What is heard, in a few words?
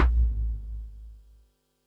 Bassdrum, Pearl SC-40